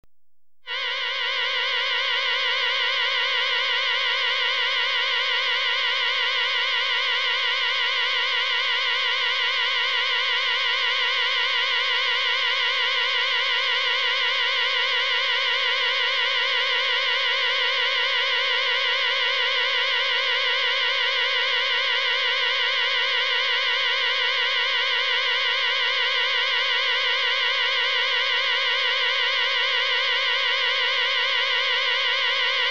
Rapid Warble Alarm, Electronically Generated Sounds; BBC Sound Effects Library